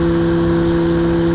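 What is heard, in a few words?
engine exhale, advanced engine sounds; engine2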